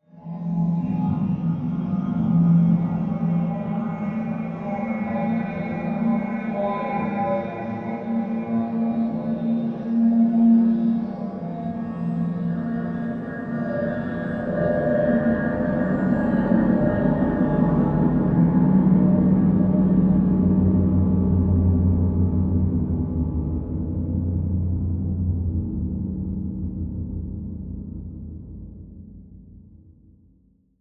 Ambient Smooth Tension; Module Production Element Title Imaging; Imaging Elements

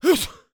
Human Male Sneeze

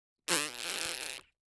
Human Farting

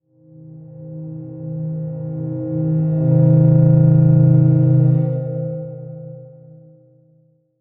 Transition Stab Dark Medium; Production Element

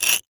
Foley Metal Scrape On Cinder Blocks Fast

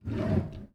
Household Drawer Open In Bathroom Quickly

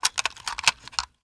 Weapon Gun Movement; AdobeCC

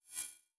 Multimedia Internet CD-Rom Flash Short

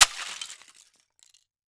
Crashes Plastic Impact Debris; AdobeCC

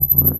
Pop Up Electronic; Multimedia Internet CD-Rom Flash

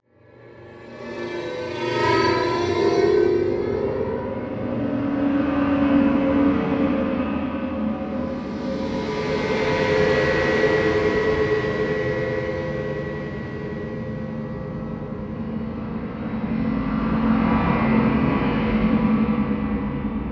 Slow Spaced; Module Production Element Title Imaging; Imaging Elements

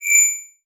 Beep High Pitch

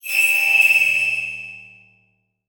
Beep Long; Multimedia Internet CD-Rom Flash

